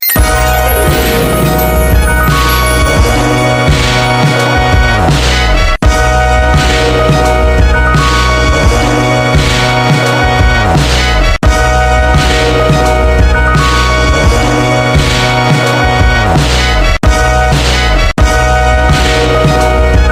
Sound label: Type Beat, Instrumental beats